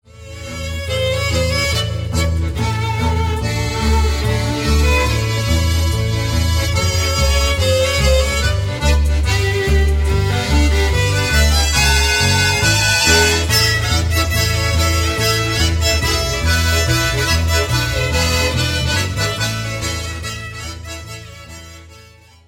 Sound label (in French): marche